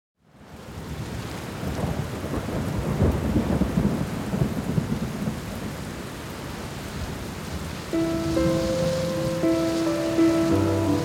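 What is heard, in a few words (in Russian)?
Пианино шум дождя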